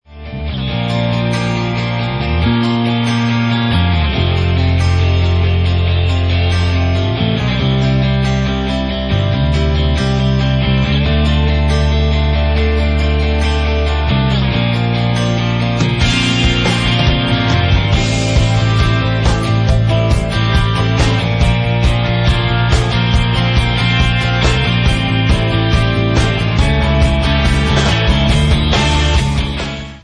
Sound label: instrumental